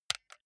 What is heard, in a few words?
console controller xbox rb creaky press hard